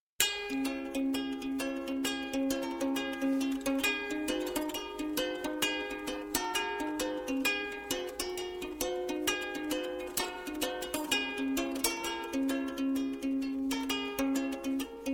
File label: Happy Ukulele